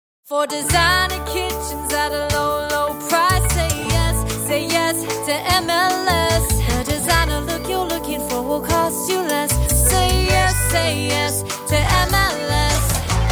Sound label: Fully Sung; MLS Kitchens Fully Sung 30